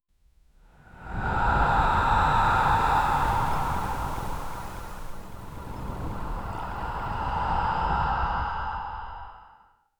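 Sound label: prehistoric cold wind ambience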